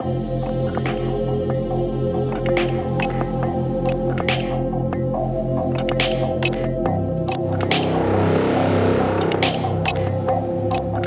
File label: Dubstep Ambient; Cryptheim